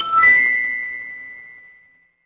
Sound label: instrument chime bar